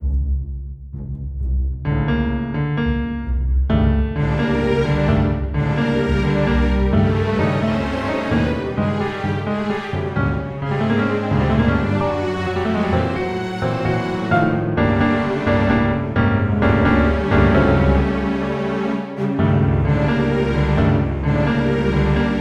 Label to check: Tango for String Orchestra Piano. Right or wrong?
Right